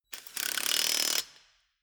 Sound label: impact wrench screw fast single